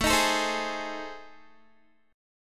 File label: A Minor Major 7th Flat 5th, Guitar; AmM7b5; strummed; chordshape; 17,18,19,17,20,x